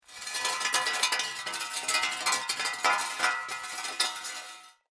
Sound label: Metal Rattle Grind Medium, Metal drag sound, scraping a metal p; Metal drag sound, scraping a metal pipe along dirt ground; Metal Sound Effects